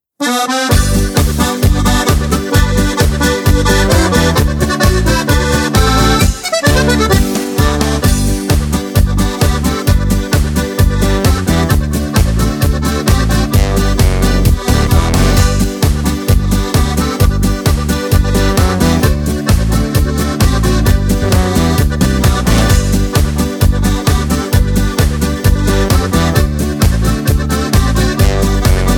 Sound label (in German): Karaoke ohne Chor